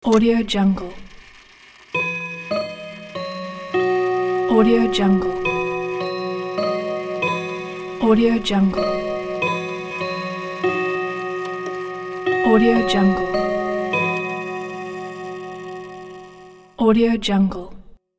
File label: clock chime